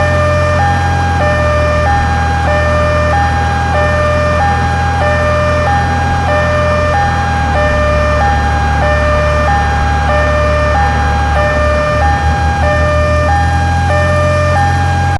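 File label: vehicle start loop police; The Sims 3; windows gamerip 2009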